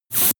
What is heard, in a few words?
air pressure release